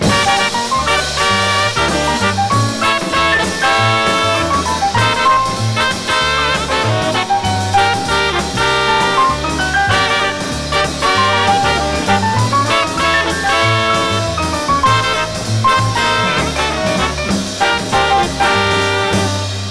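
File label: shout, minor-key agressive bossa; shout chorus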